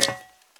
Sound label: fire escape; blindside